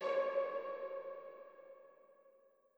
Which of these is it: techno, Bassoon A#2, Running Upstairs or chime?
chime